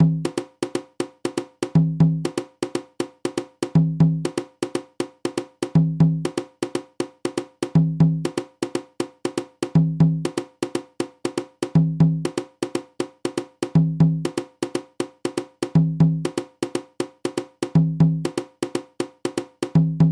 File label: yuka caja